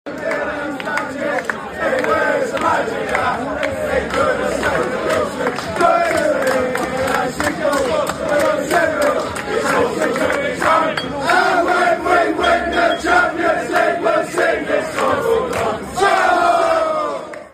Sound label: player chant, fan chants; liam delap; chelsea